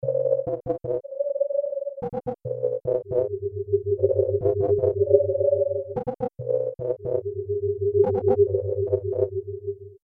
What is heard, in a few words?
pulsed Loop